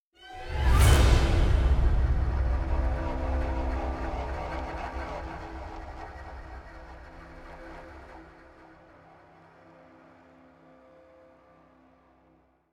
battle end sting

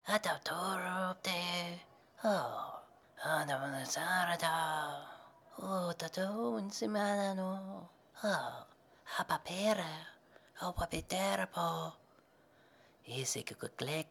pray ambient

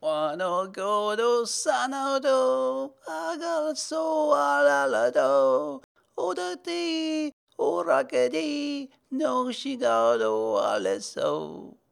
sing; Vassal; Hollow Knight: Silksong

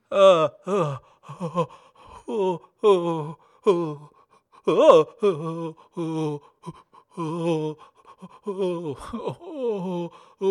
scared loop; Mort

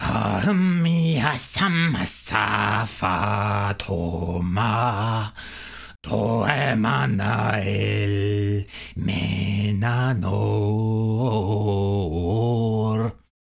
Male Enemy Song

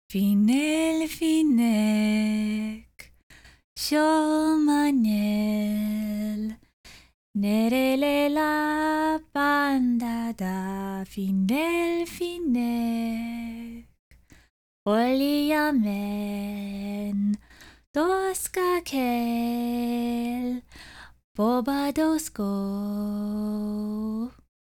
female sing

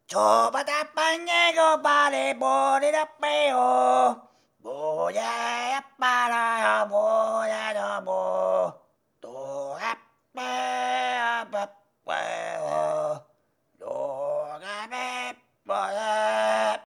sing

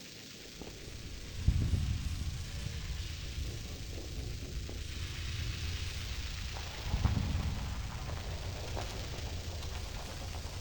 atmos coral zap area

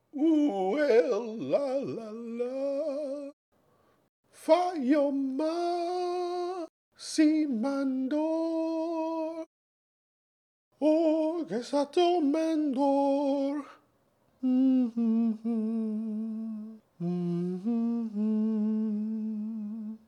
idle hum loop

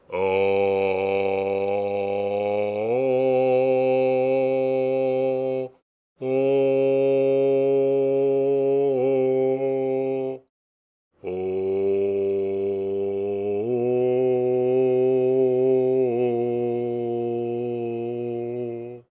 sing

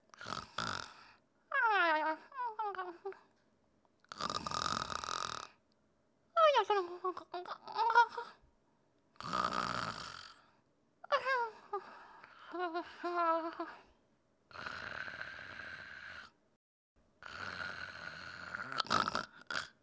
Flea sleep loop

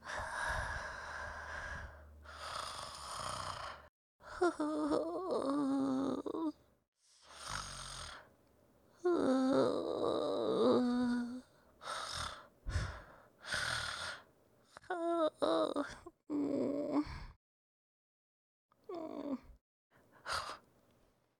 sleep loop female; Hollow Knight: Silksong

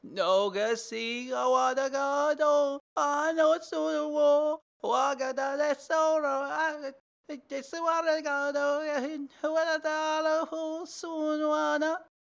Summon; Vassal

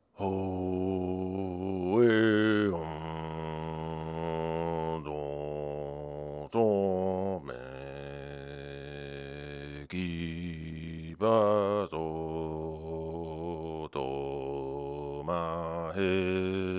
Bartender sing; Hollow Knight: Silksong

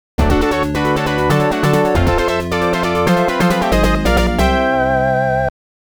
All Stages Clear; Mega Man The Wily Wars; The 16bit Audiophile Project; Genesis

